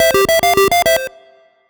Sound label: 8bit item